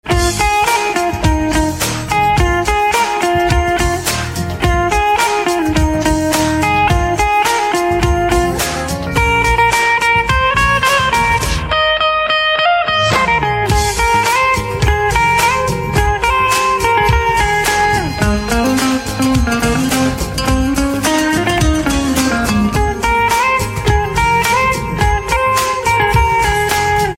Guitar Instrumental Ringtone

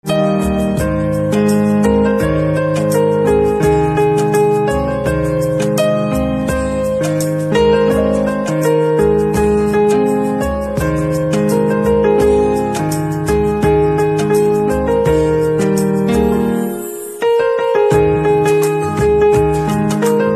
Piano Instrumental Ringtone

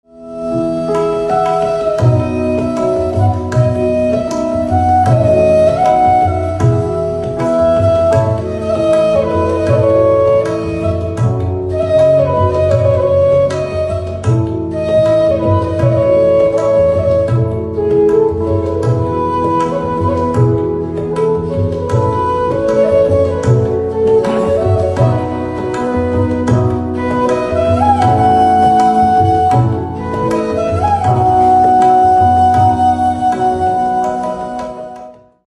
Flute Instrumental Ringtone

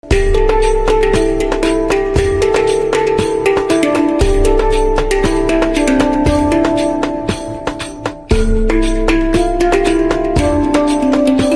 Handpan Instrumental Ringtone

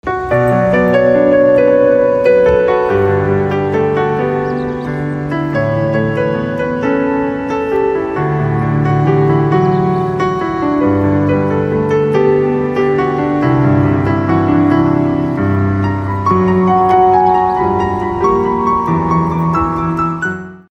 Piano Instrumental Ringtone

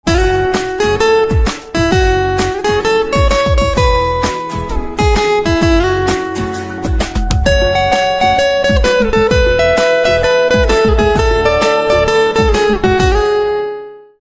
Guitar Instrumental Ringtone

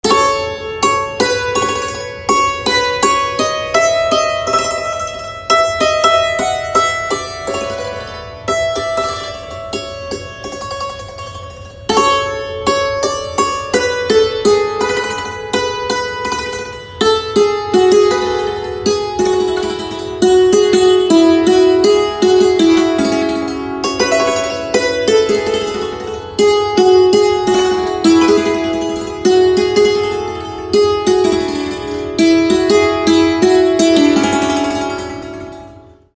Santoor

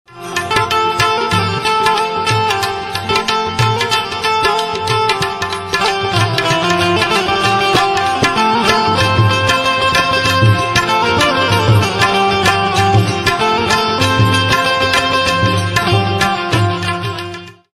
Banjo Instrumental Ringtone

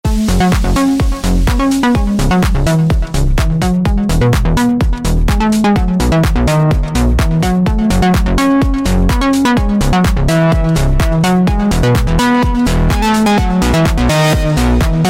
Guitar Ringtone

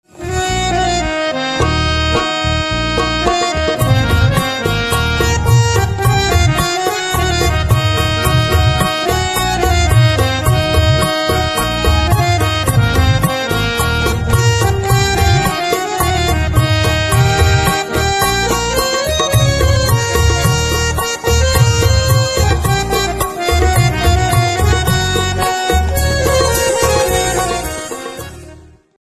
Harmonium Instrumental Ringtone

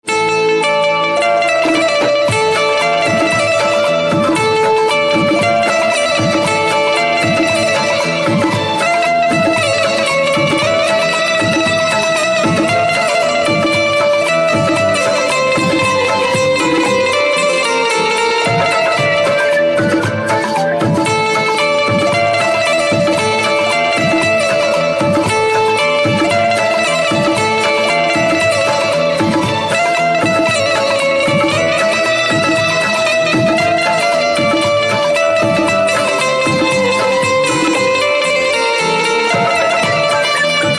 Banjo Instrumental Ringtone

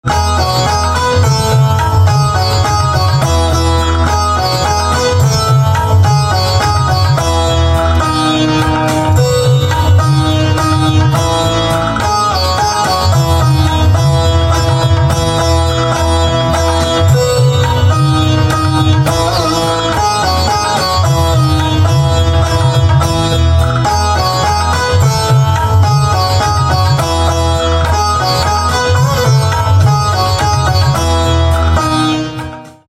Sitar Instrumental Ringtone; devotional instrumental ringtone

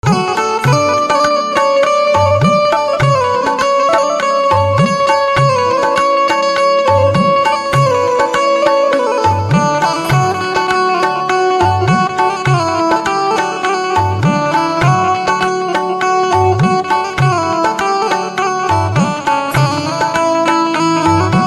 Banjo Instrumental Ringtone; devotional instrumental ringtone

